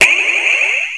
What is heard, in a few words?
target activate, LazerTag